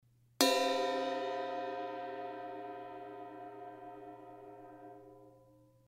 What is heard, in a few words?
A Avedis Bell, cymbal